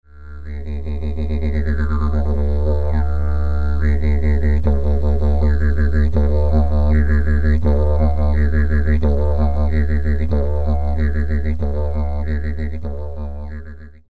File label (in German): Kiste, Didge; noch kleiner und schmaler